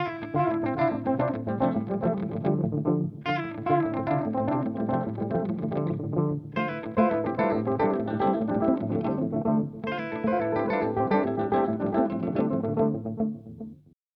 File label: Analog Delay